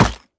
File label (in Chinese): 山羊撞击声